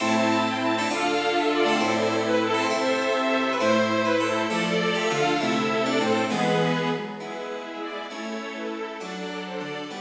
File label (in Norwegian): Gigue for strykekvartett med cembalo; Hertz